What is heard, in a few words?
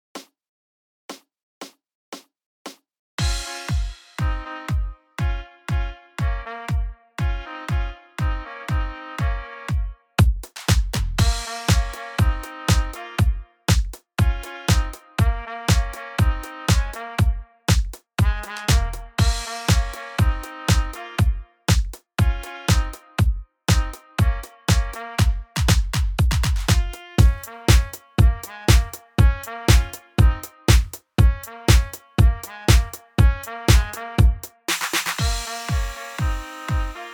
wash ya hands with parts